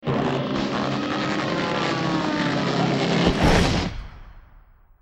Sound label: airplane toon